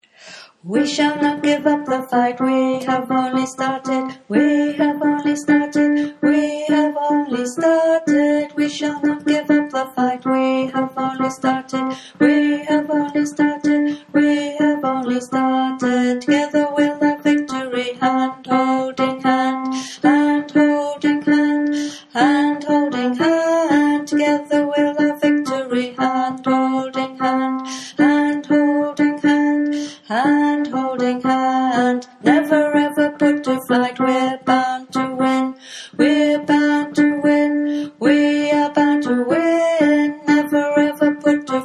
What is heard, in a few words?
tenor